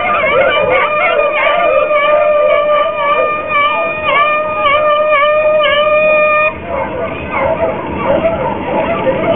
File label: hunting horn